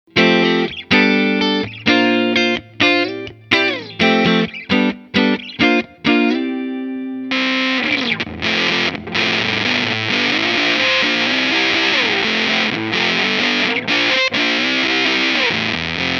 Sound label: Pepbox Amp clean EFFECT max.; British Pedal Company